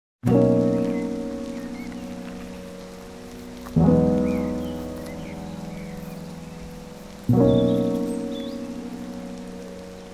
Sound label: lofi hip hop